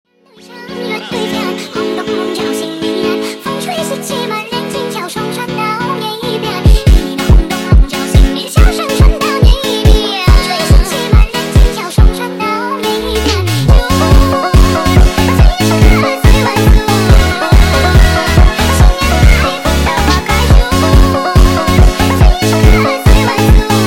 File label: Montagem sped Up